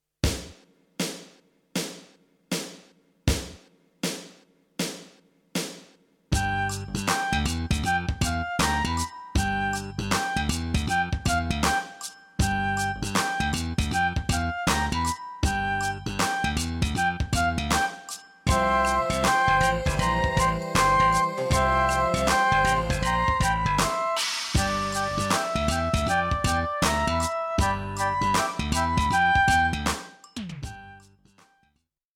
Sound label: recorder funky feels performance; funky monkey